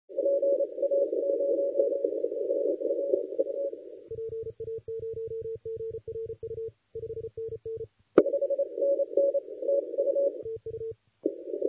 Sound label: 80 cw; PA0GRU; Opname van Dick en zijn verbinding met VP6DX (Ducie Island) op jawel..... 80 mtr!